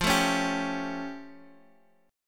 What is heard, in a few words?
A Augmented 7th F